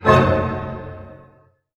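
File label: F# diminished pianissimo, orchestra hits; Sound On Sound - Peter Siedleczek's Orchestra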